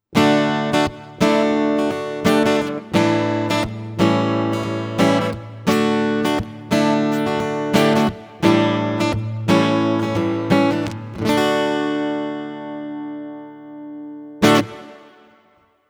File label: Reverb Western Guitar; Prussian Blue